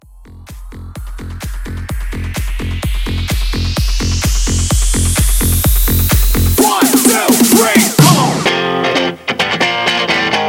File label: Hybrid Bounce 128